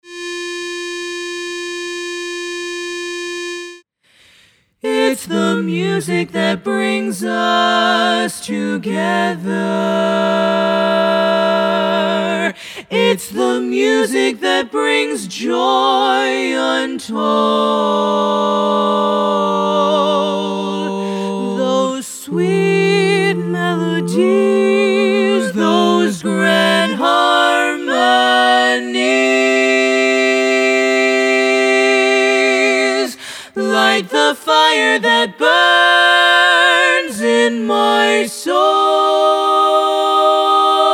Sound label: Learning Track Bari Missing